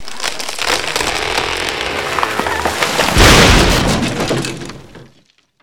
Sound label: Smash Tree Fall Crash Bridge